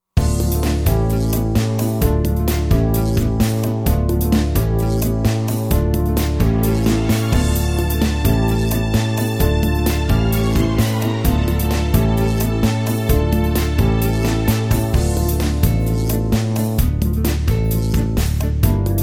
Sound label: brass minus